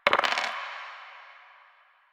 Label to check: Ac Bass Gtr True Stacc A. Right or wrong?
Wrong